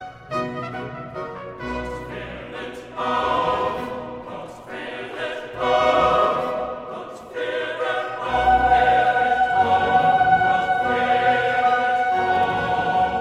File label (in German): kantate zu himmelfahrt gott faehret auf mit jauchzen coro; Kantate zu Himmelfahrt TWV 1642; Collegium Vocale; Kantaten